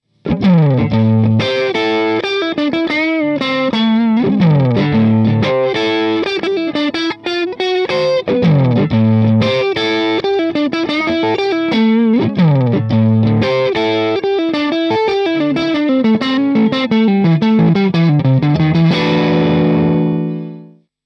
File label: Blues A